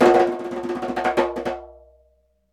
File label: conga licks; Roland LCDP14 Africa VOL-2; BTS Conga Beats